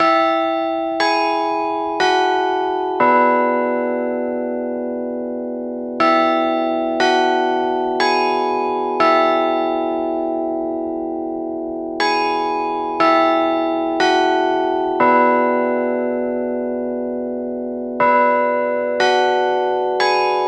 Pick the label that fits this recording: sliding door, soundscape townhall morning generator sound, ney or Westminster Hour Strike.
Westminster Hour Strike